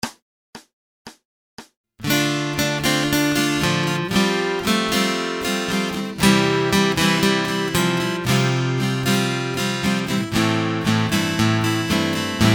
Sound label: Acoustic Accompaniment, acoustic guitar